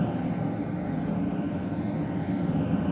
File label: Engine loop Distance; Puddle; puddle plus ios gamerip 2018